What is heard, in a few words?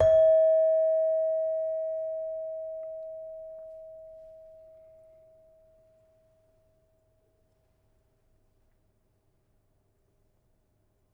Saron hard, gamelan